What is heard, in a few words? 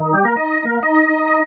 End Game; MilkChoco